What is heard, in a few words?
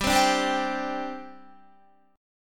G Suspended 4th, Guitar; Gsus4 strummed